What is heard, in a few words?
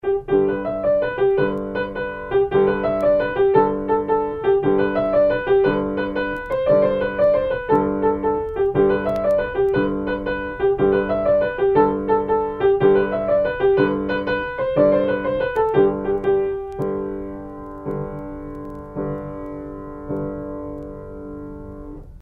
scottish bagpipes